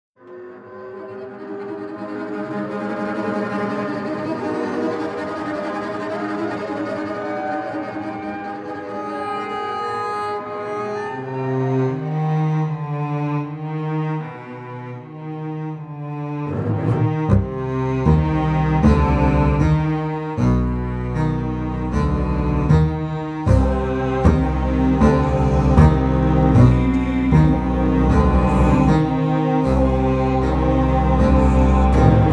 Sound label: 5 Double Basses